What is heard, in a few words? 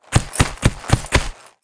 Raptor Mount Play